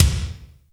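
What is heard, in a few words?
reverb kick; Big Fish Audio; Synth City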